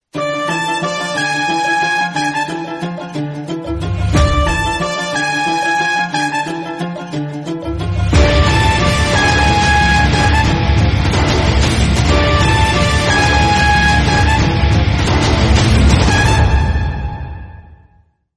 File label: Thrumphet BGM; Raees